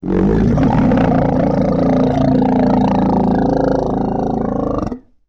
Throaty & Deep, Vocals - Monster; Sony Pictures Sound Effects Series

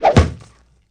Swipe With Bone Crack, Hand To Hand Combat; Sony Pictures Sound Effects Series

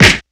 Kung Fu Old School Hit, Hand To Hand Combat; Sony Pictures Sound Effects Series

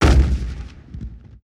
Cannon Blast; Period Combat; Sony Pictures Sound Effects Series

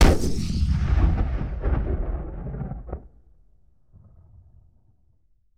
Cannon Blast Downrange Fire; Period Combat; Sony Pictures Sound Effects Series

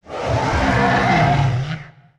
Dinosaur Design, Vocals - Monster; Sony Pictures Sound Effects Series

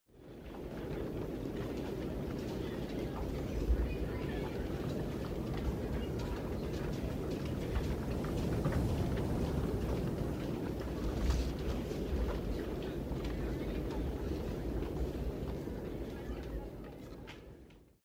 Ambience

